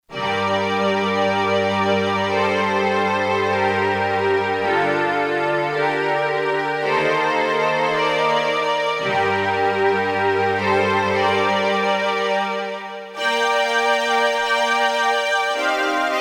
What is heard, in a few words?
Strings; Korg DSS-1 Sound Library